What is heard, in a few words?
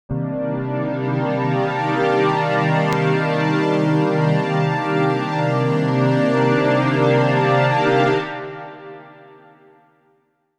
Synth Pad Cm9